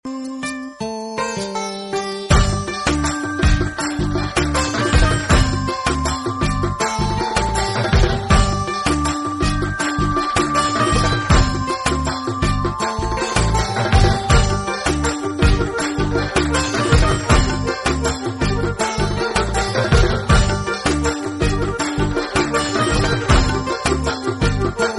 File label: DURGA PUJA MUSIC RINGTONE